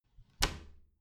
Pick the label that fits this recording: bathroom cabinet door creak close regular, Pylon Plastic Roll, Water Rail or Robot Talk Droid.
bathroom cabinet door creak close regular